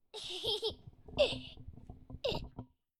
Child Giggle

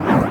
Short Jump Swoosh

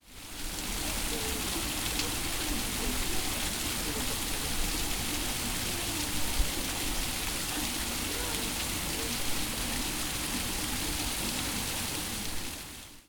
Fountain Fontaine

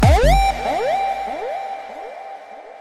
Bass

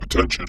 Default Beep Attention

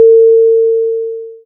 Elevator Ping

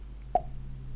Plop Sound

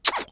Kiss Short

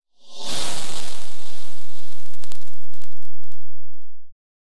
Vanished

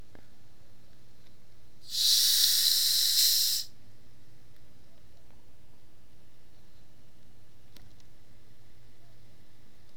Fizz

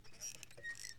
Swinging Door

